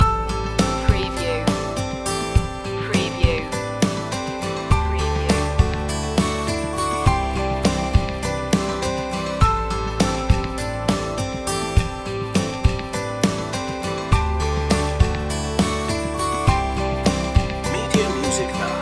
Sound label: ocean breeze loop